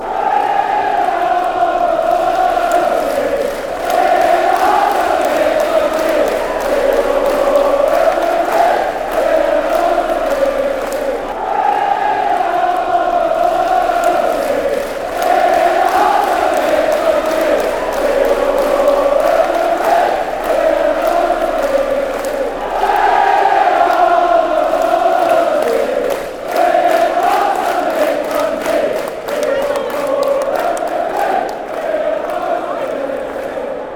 chant, fan chants; motherwell

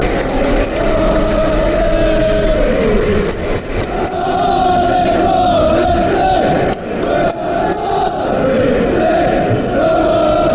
hartlepool fc

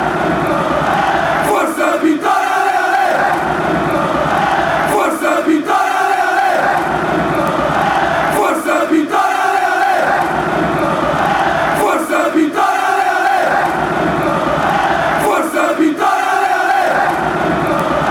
allez allez, fan chants; go vitoria

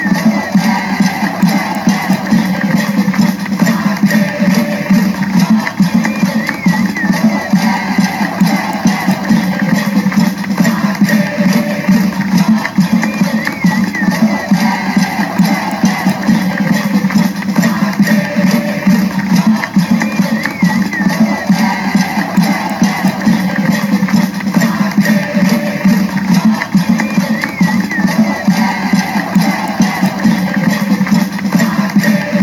ole ole goal song, fanchants; stockade

